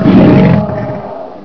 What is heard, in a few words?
die; svencoop; legends